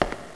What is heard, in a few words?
tile